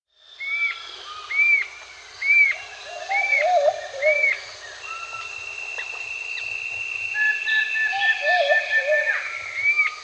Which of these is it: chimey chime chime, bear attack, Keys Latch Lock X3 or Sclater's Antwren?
Sclater's Antwren